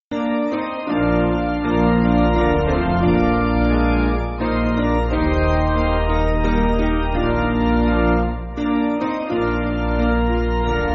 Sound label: Piano, church music